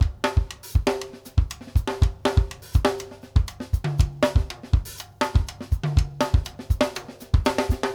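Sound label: basic, latin, drums; Sampleheads; New York City Drumworks VOL-1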